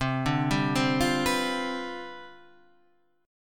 C Major 7th Suspended 2nd Suspended 4th, Standard, chord; arpeggiated; CM7sus2sus4